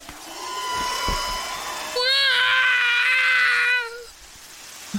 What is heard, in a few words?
waaaah